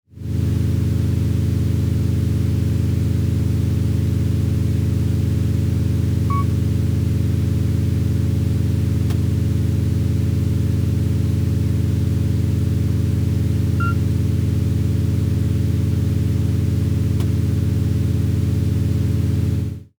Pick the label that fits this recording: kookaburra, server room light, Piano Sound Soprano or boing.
server room light